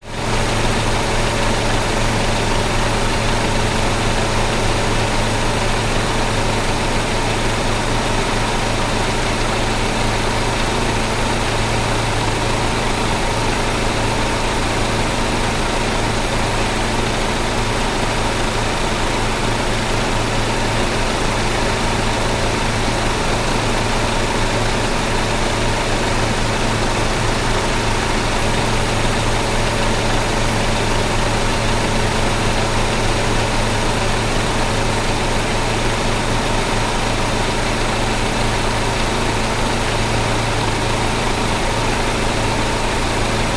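vehicles car engine idle front radiator exterior pov toyota corolla 2010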